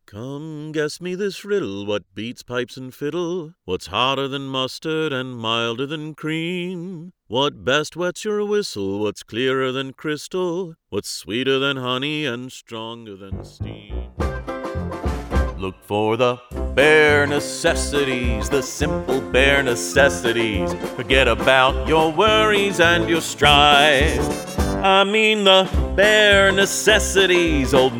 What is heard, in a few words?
singing voice